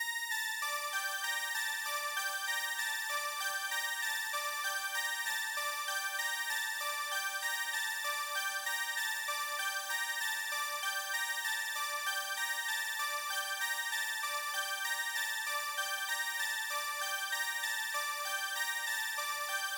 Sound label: Synth Strings 8 Bars; Vostok Inc.